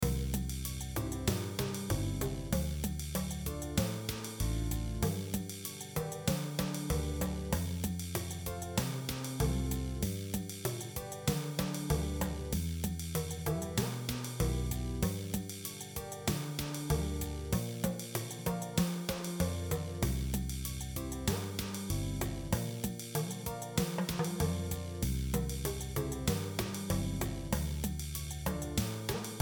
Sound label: talking drums tempo 96